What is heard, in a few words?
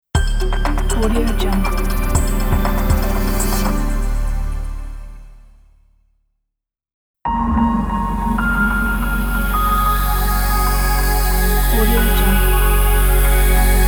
inspirational corporate logo pack